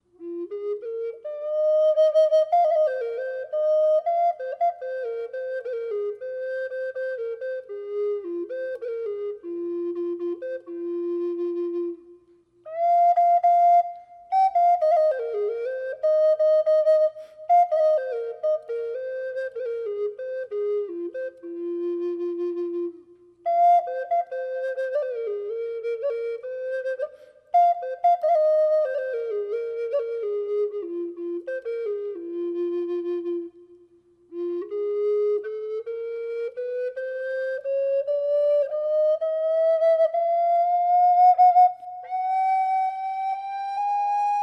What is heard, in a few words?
sound sample Fm reverb, flutes